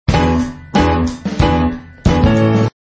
drop trio; MagnaLoops

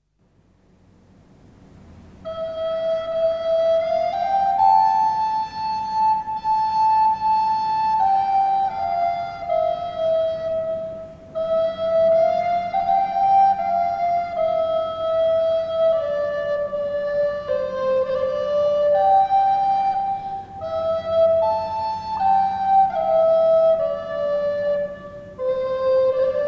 Irish Lullaby

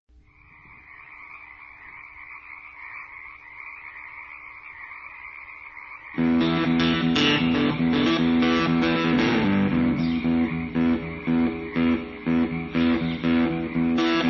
instrumental

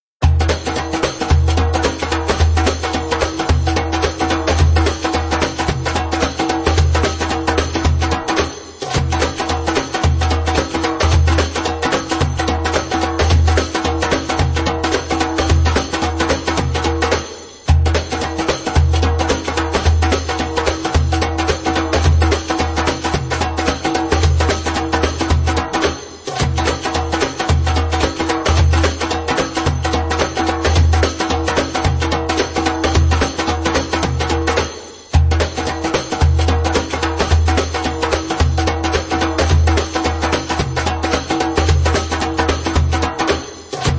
Sasebo Maqsum (fast)

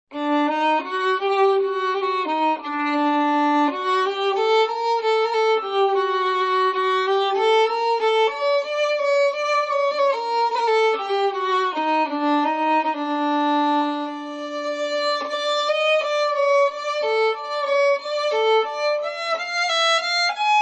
Violin Aire

